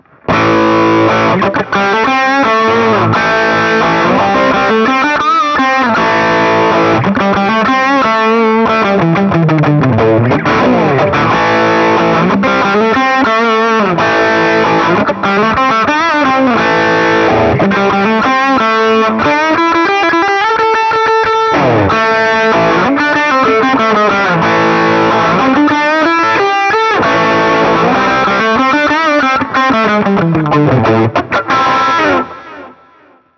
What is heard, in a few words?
Guild Starfire III, SuperTweed, Riffage; Westerly-made; Guild Starfire III from 1997